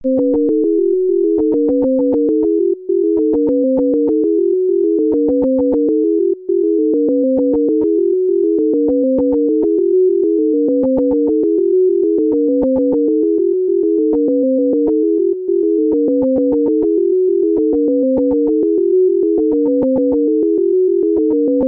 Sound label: Chromatic Illusion, musical illusions; Deutsch; auditory demonstrations